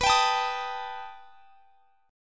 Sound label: B Augmented 7th B down; B+7; strummed